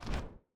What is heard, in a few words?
environment mob release all